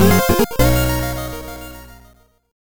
Labo Jingle; Contact; nintendo ds

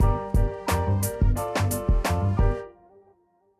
Action

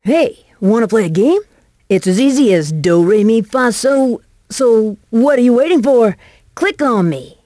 interface; lego my style kindergarden

